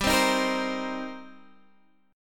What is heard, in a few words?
Eb 6th G